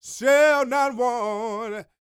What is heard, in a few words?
Vocal Gospel; Vocal Planet Vol1 Gospel